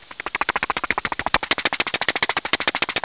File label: Bus Arrives